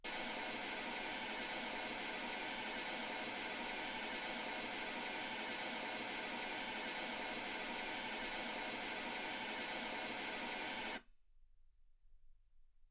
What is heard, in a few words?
mls16 48kHz